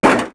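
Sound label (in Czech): píchnutí na zem; Mafie 1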